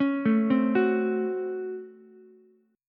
C sharp Major 7th Suspended 4th Sharp 5th C sharp, Ukulele; arpeggiated; chord; 6,9,8,9